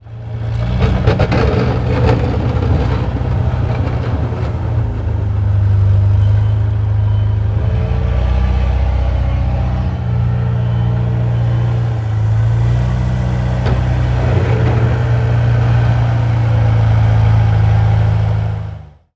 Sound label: tractor working; transportation